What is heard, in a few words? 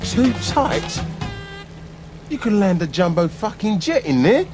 jumbo jet; snatch